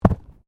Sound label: Body movement Fall Heavy; Body movement foley to simulate falls and deaths; Wrestling and Struggling